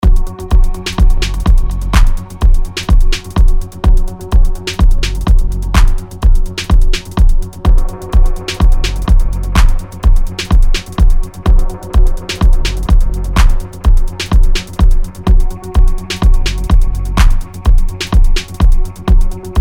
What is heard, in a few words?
the drums are real, 126 bpm